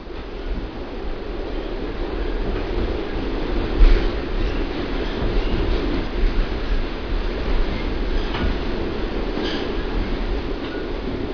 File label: Riding the train